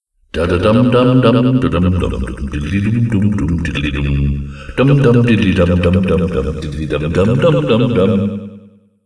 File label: pirate song; fnaf; fnaf1